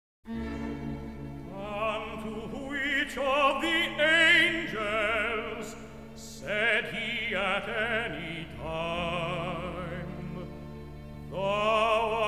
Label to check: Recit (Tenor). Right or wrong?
Right